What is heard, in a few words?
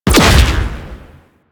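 Space Fighter Projectile Explosion; Space Fighter Gox Agent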